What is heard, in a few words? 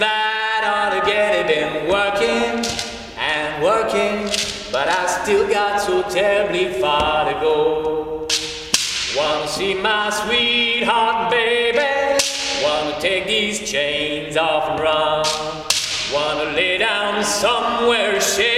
railroad work song, eglise